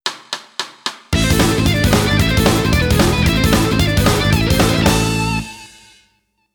One Minute Lick fast, guitar; Guitar Techniques 2018 Issue 289 December

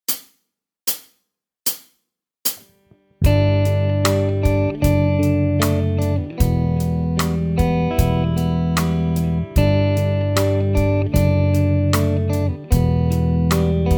Slide backing, Guitar Techniques; Guitar Techniques 2018 Issue 289 December

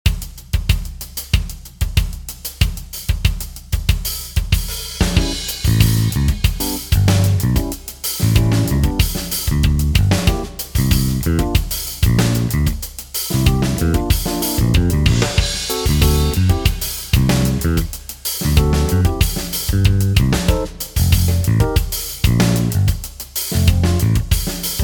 Creative Rock backing; Guitar Techniques 2019 Issue 297 July

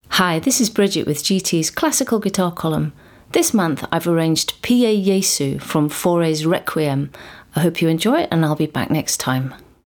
Classical intro, Guitar; Guitar Techniques 2019 Issue 297 July

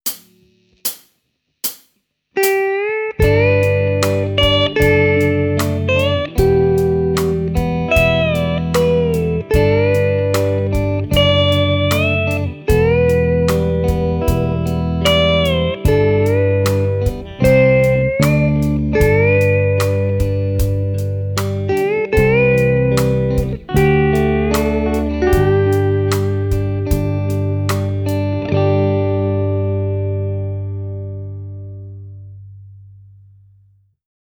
Slide, Guitar; Guitar Techniques 2018 Issue 289 December

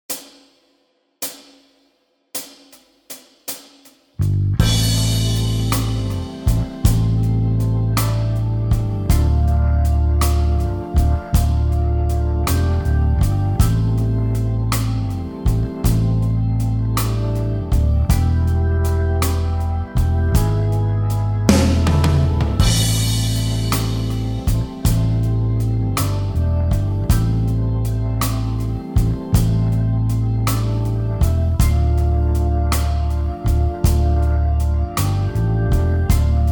Blues backing; Guitar Techniques 2019 Issue 296 June

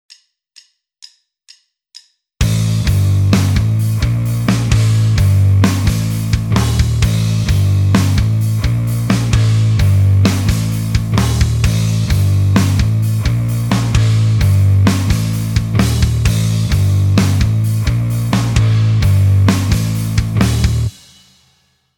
backing; Guitar Techniques 2018 Issue 280 April

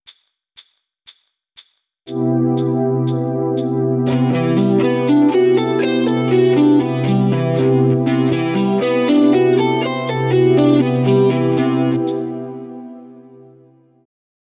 Fretboard Navigations, Guitar; Guitar Techniques 2018 Issue 289 December

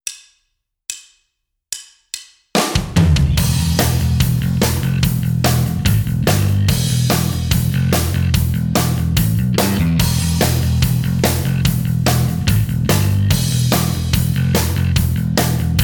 Rhythm Guitar

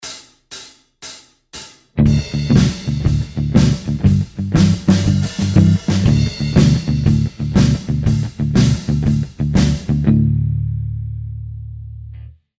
Slide backing; Guitar Techniques 2017 Issue 274 October

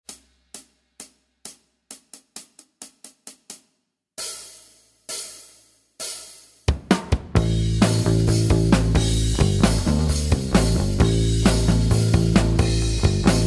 Rock backing, Guitar Techniques; Guitar Techniques 2018 Issue 289 December

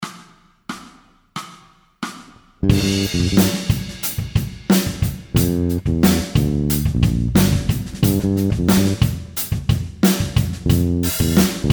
Slide backing, Guitar; Guitar Techniques 2017 Issue 272 August

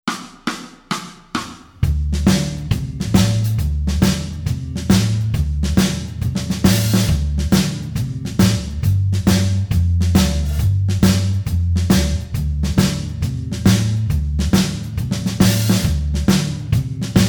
Slide ex1 backing, Guitar Techniques; Guitar Techniques 2018 Issue 280 April

